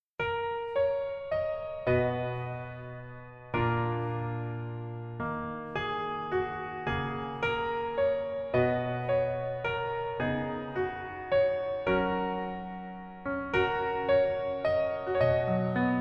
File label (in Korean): Piano ver 멜로디 MR -1 키